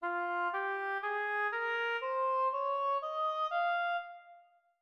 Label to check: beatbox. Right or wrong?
Wrong